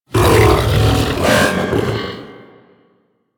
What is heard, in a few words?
Attack Growl B; Enemies; Vocals; Warframe; The New War; Archon; Amar